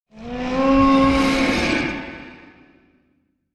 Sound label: one shot vocal wraith monster death spirit fairy ghost creature